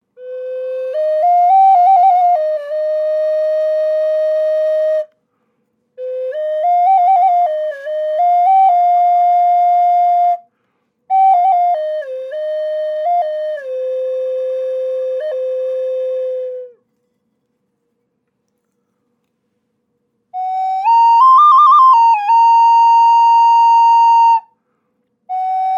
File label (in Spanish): flauta doble grande